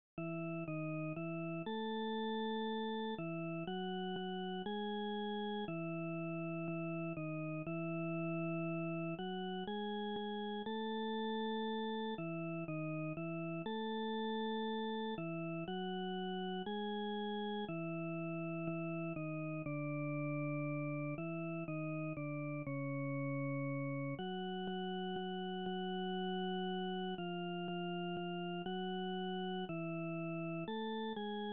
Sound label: Tenor